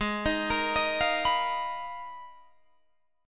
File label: G sharp Minor 6th Add 9th G sharp Guitar Drop D, chord